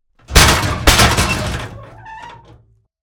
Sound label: Metal grate open with hit